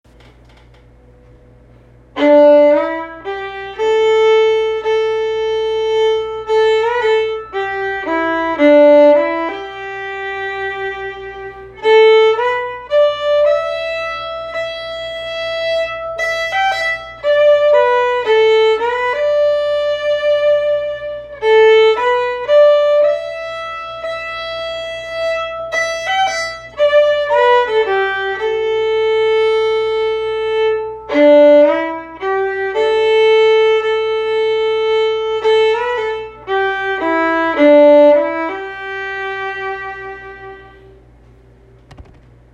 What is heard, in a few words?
Elk River Blues